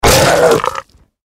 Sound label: watcher death